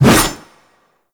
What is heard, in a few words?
Melee Whoosh; Warframe; Wraith